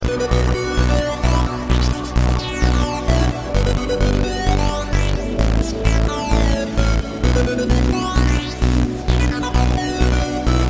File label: Nokia Tone; Nokia 2011